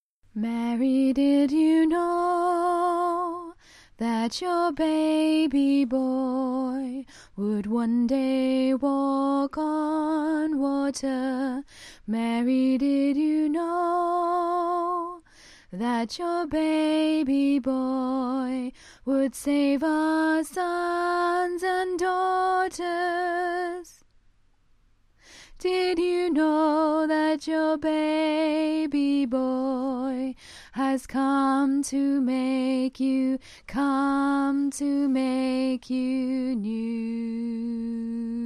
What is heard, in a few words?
alto, choir